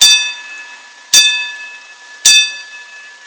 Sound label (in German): Glocke